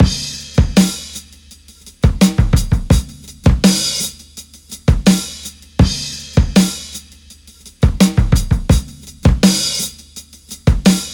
83 bpm drum groove c sharp key, kick tuned to the C# note